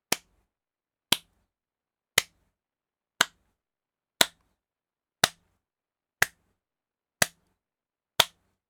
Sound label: slow clap